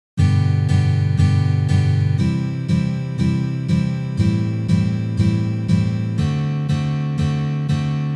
Uprazhnenie s akkordom D7

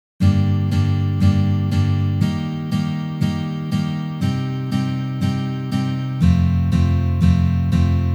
Uprazhneniya dlya igry akkorda Sol minor na gitare

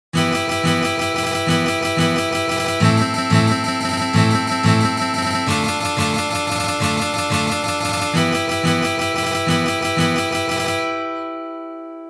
CHechenskij boj na gitare

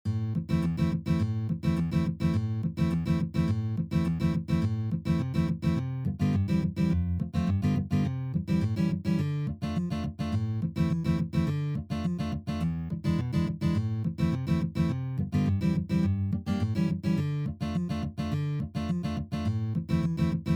Boj

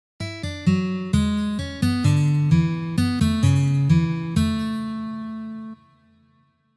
Nokia na gitare

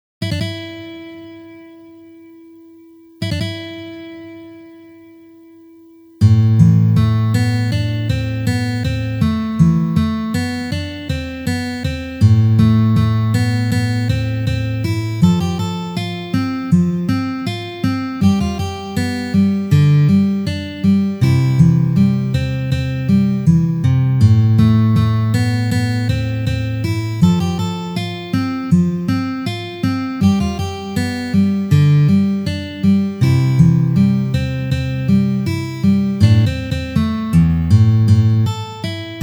na gitare

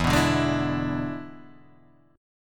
Boj E7

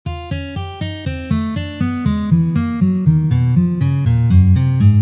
Trenirovka na gitare pauk vverh

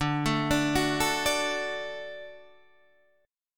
Perebor D

